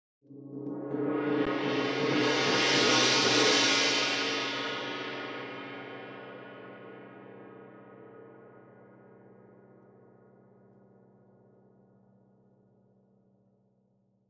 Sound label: Large gong roll